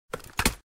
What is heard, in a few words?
short draw; EMXArms; scgraves